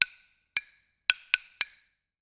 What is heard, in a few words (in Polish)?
Pudełko akustyczne; strument wykonany z drewna bukowego o wymiarach 17x6x2,3 z pałeczką drewnianą w komplecie